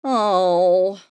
Female resigned reaction

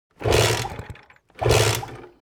Scooter ignition start pedal engine fail

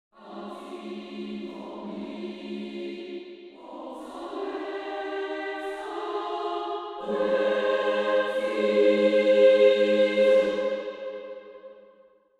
Angelic choir angels; a symphony of celestial blessings

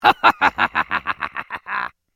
Mobile game character laugh; video game

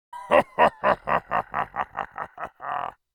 Orc creature laughing

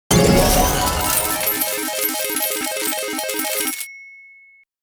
Casino slot machine jackpot big win

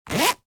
Coin pocket zipper wallet; foley; Wallet and Purse

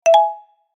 Gentle alert; shorts sound effects

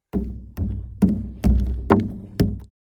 Ship interior footsteps shoes on stair; boat

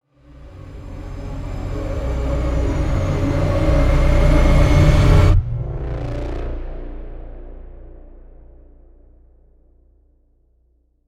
Horror cinematic string riser

